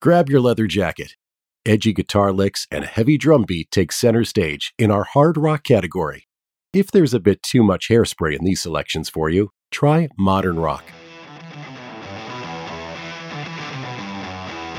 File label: Hard Rock, music on hold